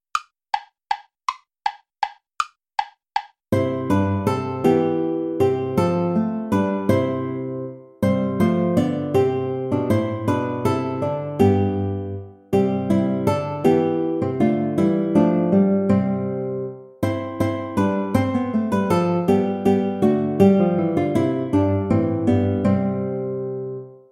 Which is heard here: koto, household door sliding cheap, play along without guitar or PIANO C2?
play along without guitar